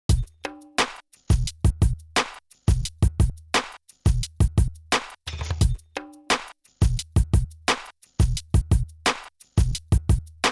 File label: Loop Flat; Daking; Fet III